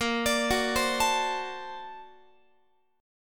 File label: Bb Augmented Major 9th Bb, Guitar; Bb+M9 arpeggiated; JGuitar